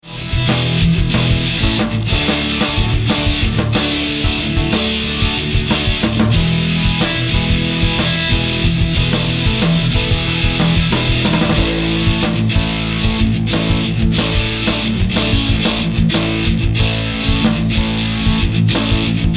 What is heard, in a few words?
Rock Tracks